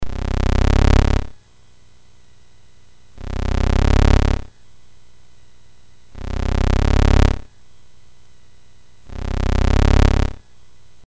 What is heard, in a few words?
BASIC sid MOS6581R4, GAMES; Sword of Fargoal